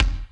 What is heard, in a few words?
BD hip, Dance Mega Drums; Best Service